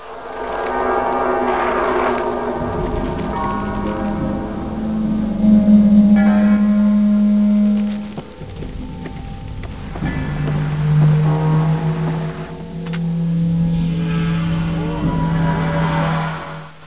general eerie

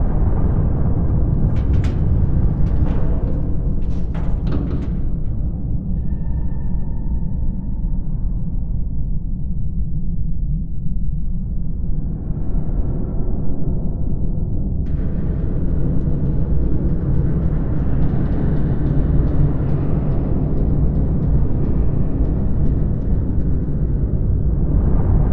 ambience disaster foreboding

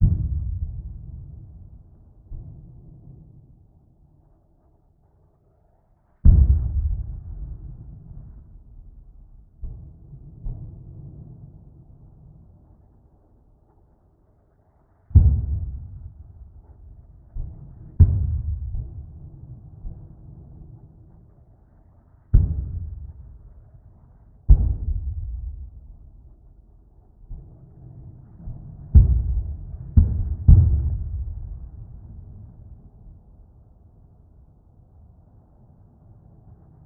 ambience mortars

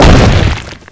big hit; de hl stronghold

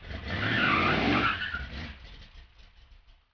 car

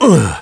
pain

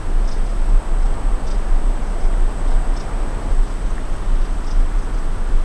ambi ext

